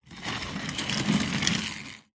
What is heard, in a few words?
office chair roll on tiled floor one wheel slightly jammed